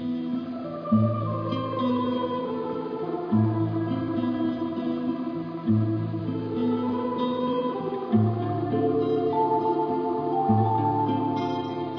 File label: melody